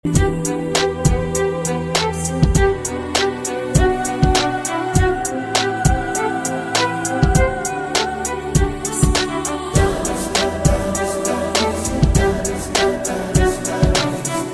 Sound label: soft and smooth tone